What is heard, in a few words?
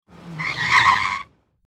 sliding, sliding tires; sliding car sound; teststrecke-game